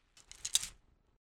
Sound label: Grab arrow